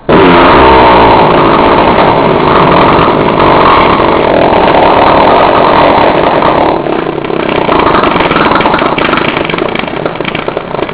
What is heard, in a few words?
chainsaw fart